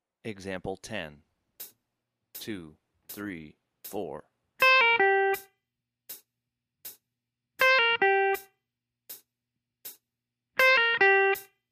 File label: slurs, blues guitar